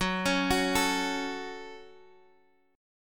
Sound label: Gb Minor, Guitar, Half Step Down